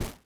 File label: step snow